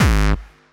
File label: gabba